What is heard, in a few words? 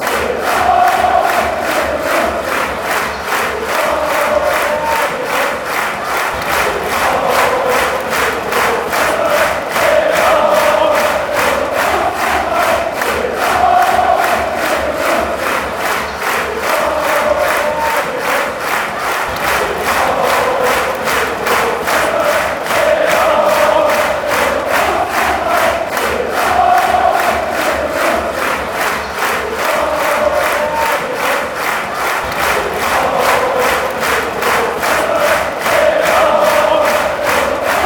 chants; stand up